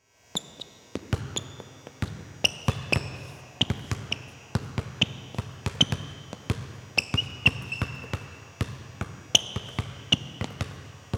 Sports Basketball Dribble With Shoe Squeaks Lights On